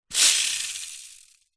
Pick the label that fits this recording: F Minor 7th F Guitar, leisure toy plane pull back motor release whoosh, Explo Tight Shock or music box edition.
leisure toy plane pull back motor release whoosh